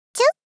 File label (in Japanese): ちゅっ 少女×中音域